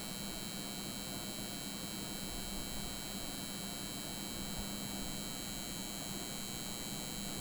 Ambient Locker Room; Among Us

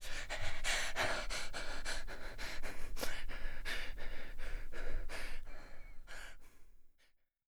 Foley Breathing Slowing Down quieter; the 39 steps

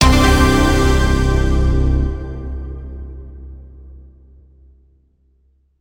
Zombie Level Up

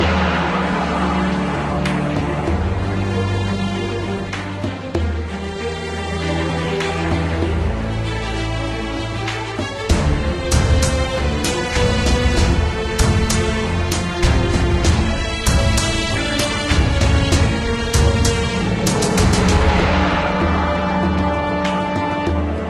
Music; Brave Fighter 2 Monster Legion; Android

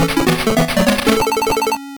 Bonus Fail; bonanza bros master system

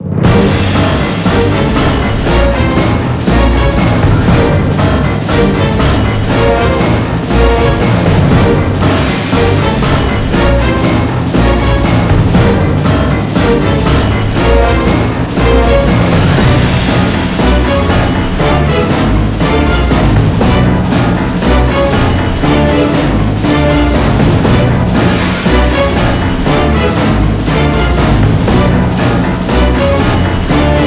Knockdown; the wonderful 101

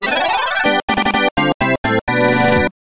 Champion Fanfare; escape kids arcade gamerip 1991

